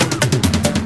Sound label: Loops Fills; Vengeance Samples